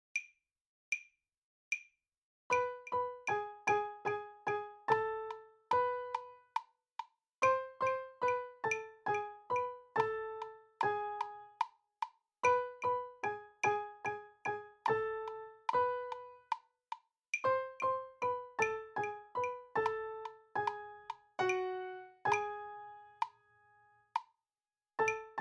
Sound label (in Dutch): Sakura Piano langzaam